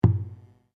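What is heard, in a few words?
Drum Taiko Large piano, Japanese Taiko Drum; Miscellaneous Musical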